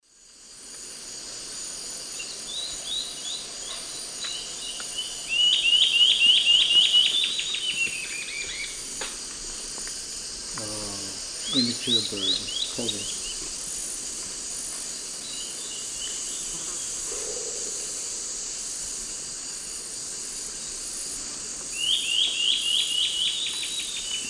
Gymnopithys leucaspis, songs adult and juv; S. America; Eastern Lowlands

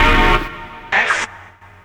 Synth, Techno

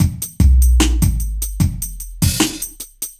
hiphop

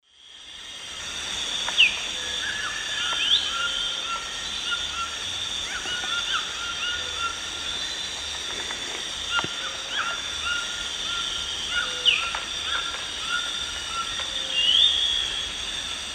Euphonia laniirostris, song; S. America; Eastern Lowlands

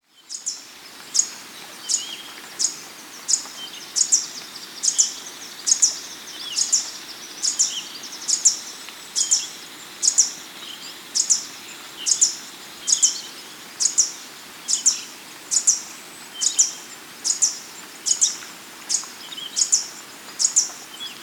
Green Violetear (Colibri Thalassinus; S. America

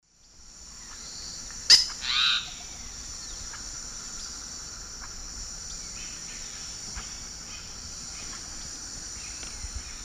Piaya minuta, call; S. America; Eastern Lowlands

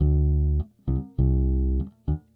Stingray, Rock Bass; Old Grey Stingray

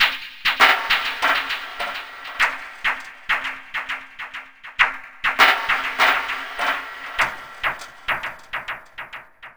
Metal Snake Groove; Electronica

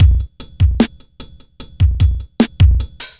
hiphop

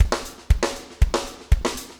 4 on floor 120, loops, 120bpm

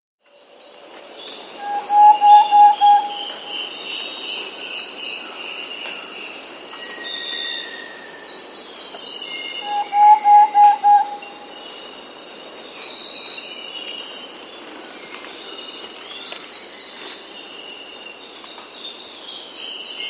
Thrush-Like Antpitta; S. America

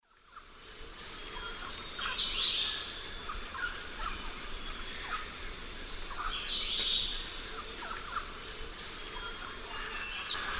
Platyrinchus coronatus, song; S. America; Eastern Lowlands

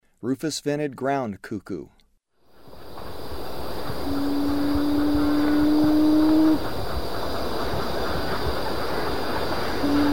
Rufous-vented Ground-Cuckoo; S. America; Eastern Lowlands

